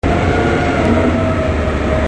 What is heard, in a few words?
Diesel Engine; Machines